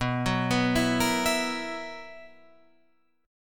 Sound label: B Major 7th Suspended 4th B down Guitar Drop D